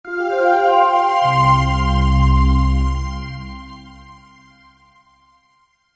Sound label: Startup, Computer: Short Musical Style Phrase